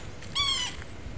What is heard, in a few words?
Yellow-bellied Sapsucker